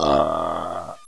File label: pain, zombie